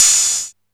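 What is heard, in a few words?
hat open, Alesis DM5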